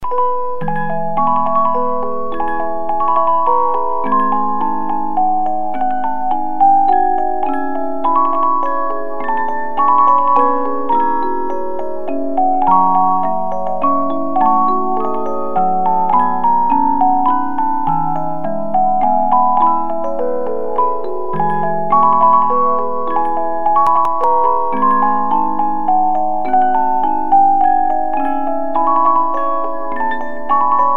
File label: Liebestraume, 50 Note music box movement